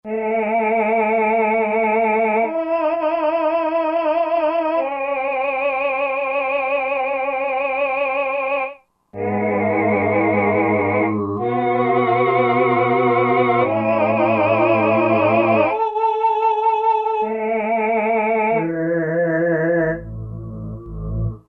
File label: Tron Vox, Roland SR-JV80-08; '60s & '70s